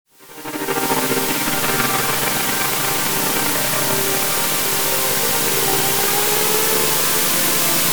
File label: pad; reach